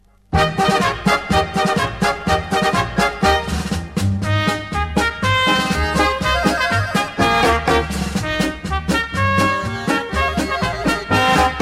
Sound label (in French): Kreutz Polka, Autriche